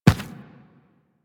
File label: Infested Medium Run Default, footsteps; Warframe